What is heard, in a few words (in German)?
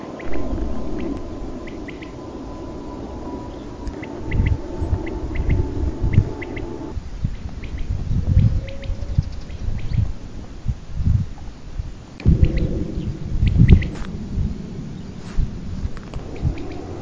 Blub